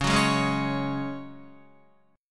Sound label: Db Suspended 4th Db, Guitar; strummed